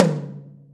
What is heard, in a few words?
Concert Tom ff; Digital Sound Factory Virtuoso